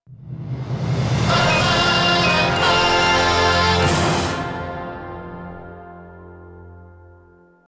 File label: Goal Fanfare; crash bandicoot series sound box; Evilocity